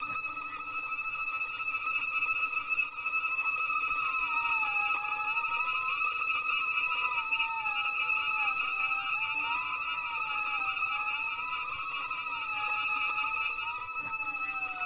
Scary Violin

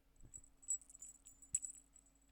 Cat Bell Sound Tingeling

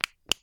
Flashlight On

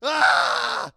Screaming

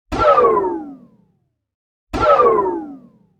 Ricochet Metal; Cgeffex

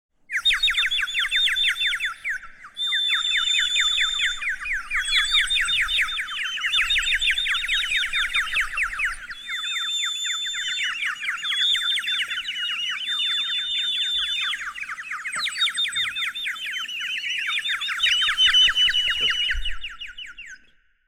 Birds Sound

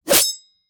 Knife Slash Spike Trap Sharp Sound

